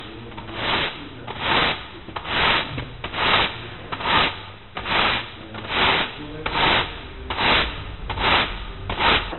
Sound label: broom